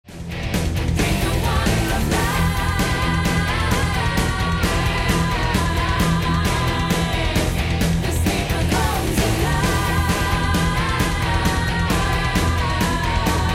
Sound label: space metal